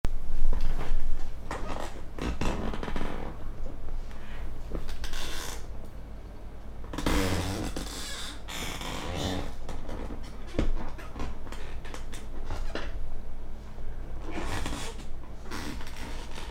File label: Squeaking Chair; FXHome